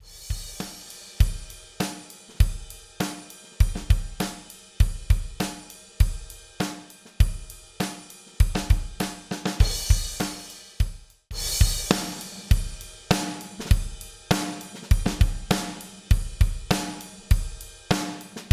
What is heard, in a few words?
comp drums out in, Drumset with compressor on kick and snare (off, then on); Mixing for God audio examples